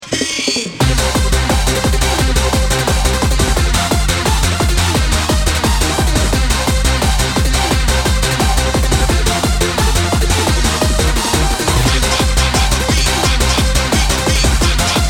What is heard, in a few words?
Rok Da Beat